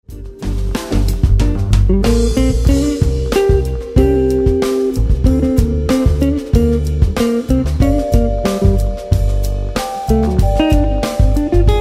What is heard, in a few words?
Jazz